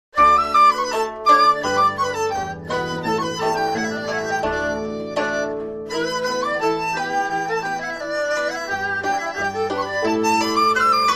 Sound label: Picking Tea Leaves